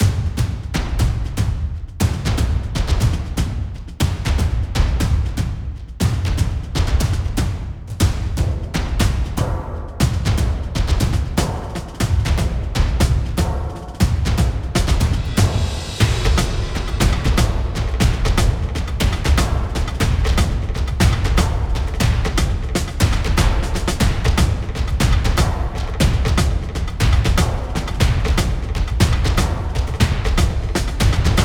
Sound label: Taiko Trial; Tanuki Sunset